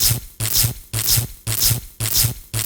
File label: electric; blackquill